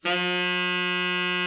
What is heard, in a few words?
TENOR, Sax; Giga Samples Collection